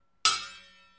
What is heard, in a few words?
Hiting Metal Lid Againts Table